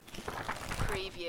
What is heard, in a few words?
paper fanning book